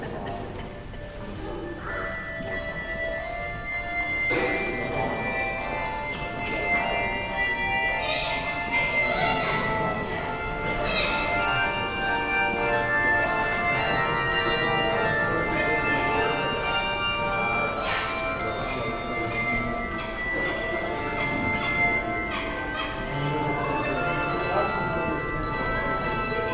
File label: Handbell